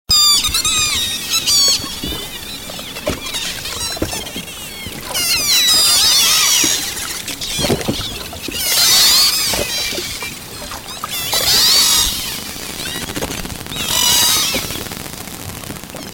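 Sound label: spring dolphin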